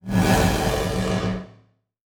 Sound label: Transition Whoosh Medium; Production Element; Title Transition; AdobeCC